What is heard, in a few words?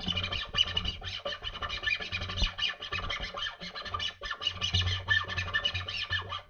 scratch